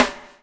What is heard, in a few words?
BUBBLE; Spectrasonics - Backbeat